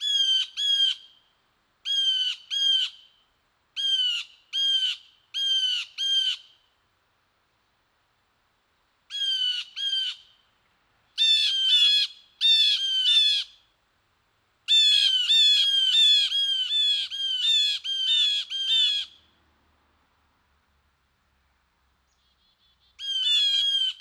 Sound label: Blue Jay